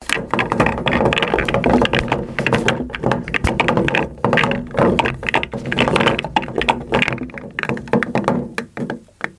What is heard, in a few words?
Snooker Pool Balls